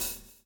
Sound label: swing